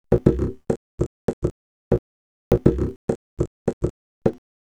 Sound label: Beat; Sound Effect Library; Electronica; Concrete Beats; Dry Cell